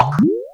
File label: Kamek Broom Transform; New Super Mario Bros. Wii